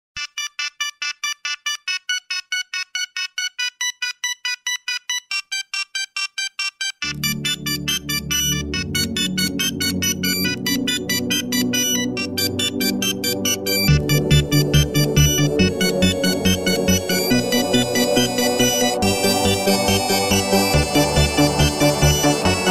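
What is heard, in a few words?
classical old ringtone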